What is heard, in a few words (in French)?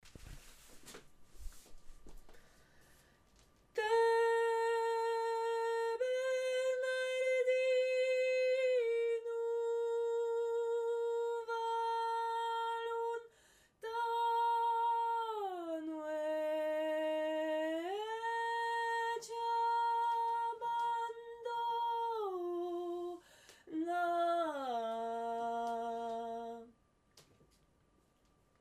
basse octaviée; Ateliers chant; bernardinu